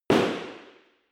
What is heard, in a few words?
impact hard indoors short